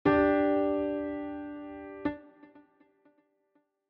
Gm 1st Inversions

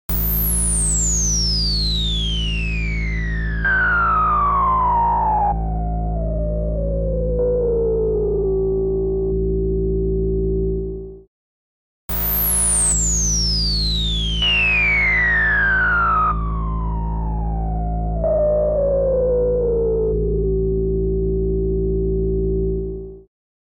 MB Compression on Bass Guitar ON and OFF A B test

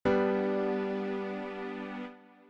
G chord

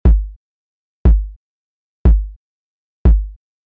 808 Kick Bell EQ

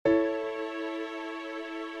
2nd Inversions

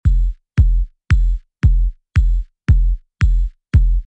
Sound Design KICK WITH TOP

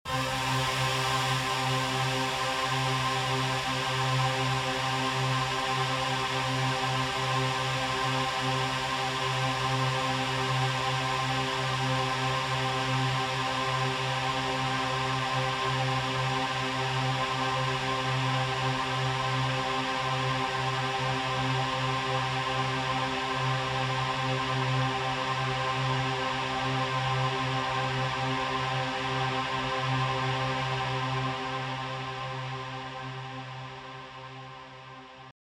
Reverb Tail Frozen C

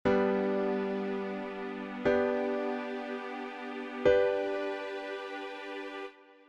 G All Inversions

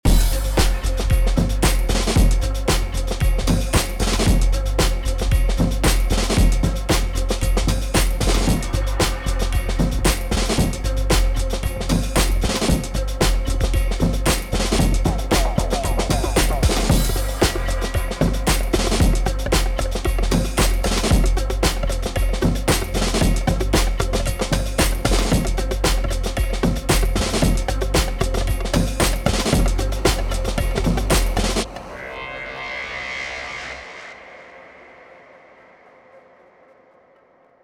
Bad EQ Reverb

